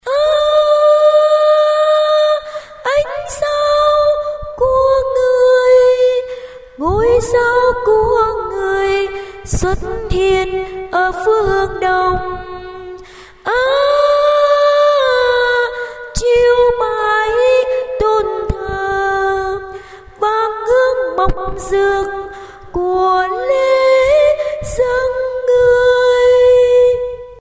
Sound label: tenor